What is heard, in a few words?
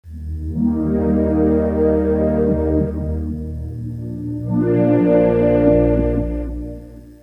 warm